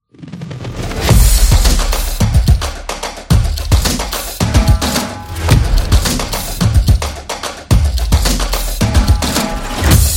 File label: Sports Drums